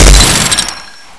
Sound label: minigun fire; brainbread